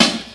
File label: perfect acoustic snare sample a sharp key; snare drum samples